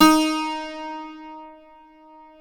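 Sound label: plucked D#3 bouzouki, Greek Bouzki; NorthStar - Global Instruments VOL-1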